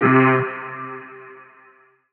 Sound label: vocal down C; Slate; AURORA Sample Pack; Vocals